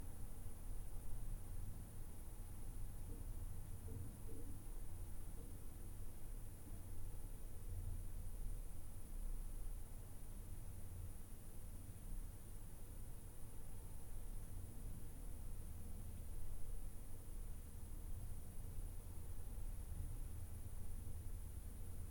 Quiet Room House A Basement Boilerroom; Systematic Sound; General Ambience Series; Quiet Rooms 01

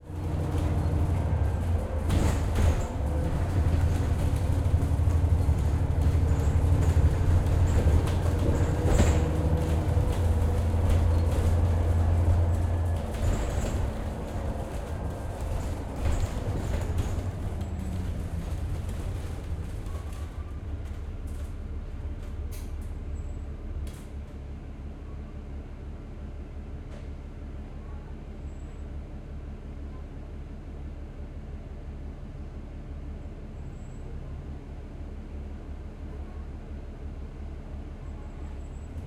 Interior Ambiance Of A Moving Bus, UK; 344 Audio; UK Traffic & Vehicles

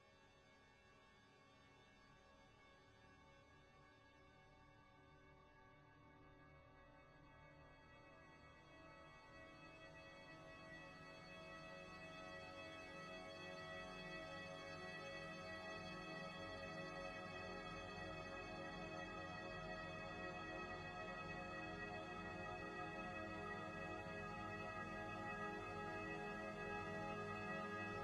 Tension by Brass; BeautifulNoise; Intensity by Design

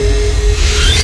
default move, door; hl2; func door

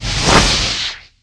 cremator plasma ignite; hl2

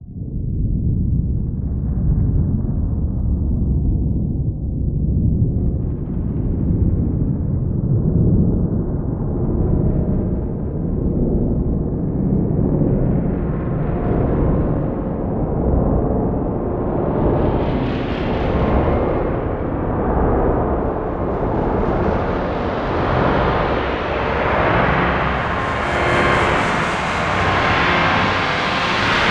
sci fi big spaceship, ATMO; Soundbankster; The Sci-Fi Book

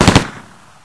smg1 3 rounds; hl2

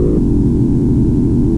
air turret fly loop, vehicles; hl2

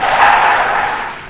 atv skid high friction, vehicles; hl2

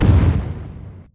strider step; hl2

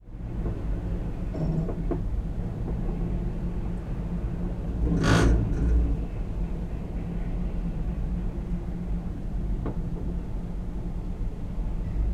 50s elevator ride down; 3maze; The Elevator

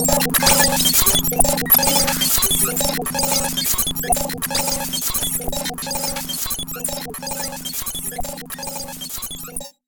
Computers, Sci-Fi Systems; David Dumais Audio

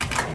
shower hit; hl2

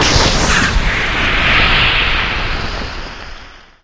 big ignite, fire trap; hl2

